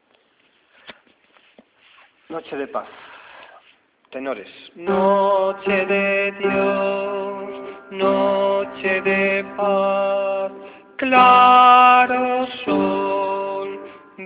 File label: tenores